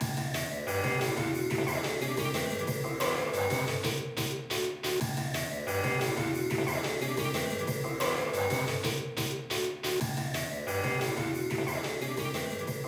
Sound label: BGM Mission Stage Leak; Alterna 01